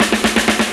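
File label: drum roll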